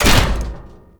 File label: loot locker open; Warframe; Tau